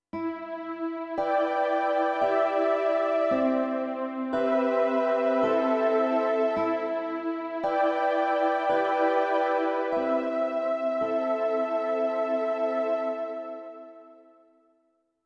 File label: Atmosphere